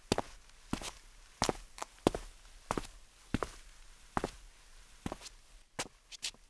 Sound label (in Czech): chuze Tom; Mafie 1